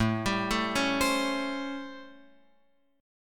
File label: A 7th Sharp 9th, Guitar, Open F; A7#9; arpeggiated; JGuitar